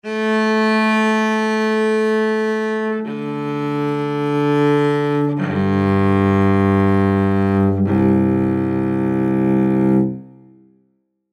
Cello Tune; Strings; Blastwave

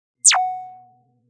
Zap; Zaps; Electronic

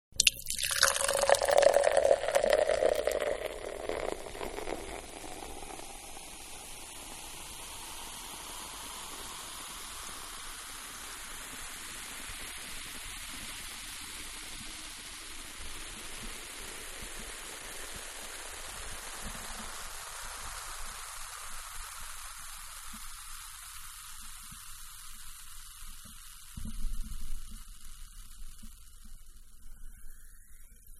Soda Can Pour; Pouring; Can Soda-Pour; Objects; Manhattan Production Music

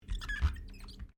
Glass Squeak Rubber; Material components for constructing more complex sounds; Materials

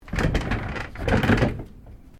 Chair office wheeled move; Office furniture; Chairs; Offices

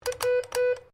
MORSE CODE W; Morse code aplphabete; Morse Code (Sound Effects:Electronics)

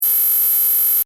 Computer Data Streaming Effect; Computer Sound Effects; Computers (Sound Effects:Science Fiction); Stuart Duffield

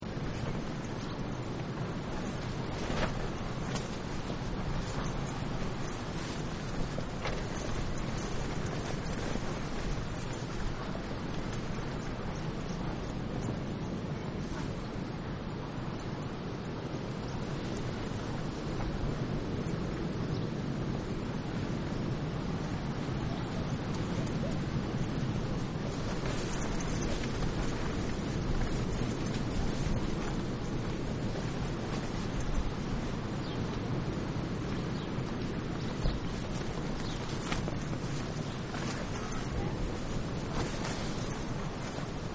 Tent Flapping Breeze Surf; Nature Scenes; Environmental; Partners In Rhyme

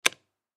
Switch Plastic, Switch Click; Switches (Sound Effects:Objects); Blastwave; Foley

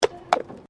Tram Horse Drawn Horse Step Pavement

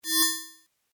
Tech rollover, Rollover: Short, High Tech Effect; Mouseovers; Multimedia; Mouseover Sound Effects

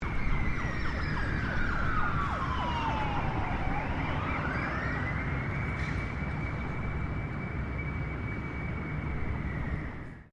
SIREN EMERGENCY SERVICES DISTANT, Distant siren; Sirens; Electronics

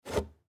Tissue Box, Tissue Pull From Box; Paper; Household; Materials; Blastwave

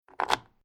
Quad Bike Motor Bike Key In Ignition Fast; Transport Agriculture; Atv; Atv Sound Effects

